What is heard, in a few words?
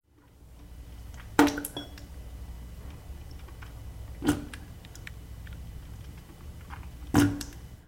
spit